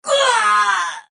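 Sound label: spy pain critical death; Adachi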